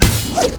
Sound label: hookshot; hookgun